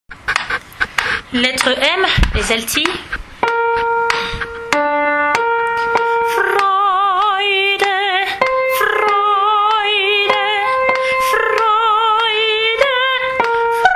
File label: Brahms requiem allemand soprano; lettre M